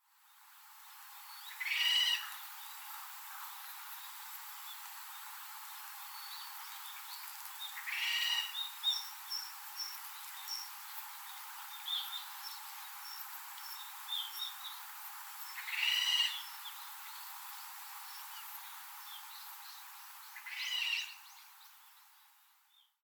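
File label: Okinawa rail, yanbaru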